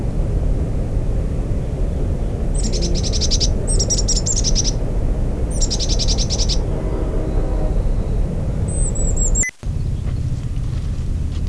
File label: chickadee Call, Carolina Chickadee